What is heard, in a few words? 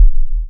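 waveform FM sine sine envelope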